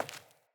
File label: Sandals Walk Default, footsteps; Player